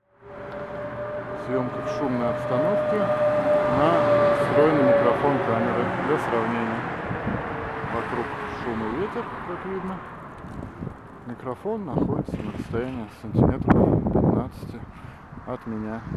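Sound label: mke200 noise built-in, микрофон для камеры и смартфона; Sennheiser MKE 200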